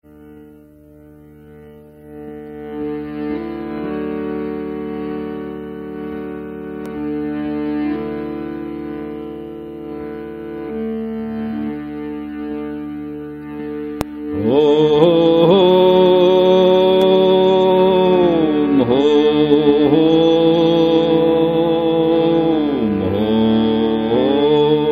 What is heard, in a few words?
Hindu Chant